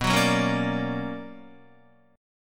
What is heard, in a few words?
B Minor Major 9th, Guitar; BmM9 strummed; JGuitar